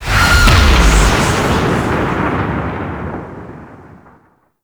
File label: Cet Invasion Offering Paused Generating; Warframe; Cetus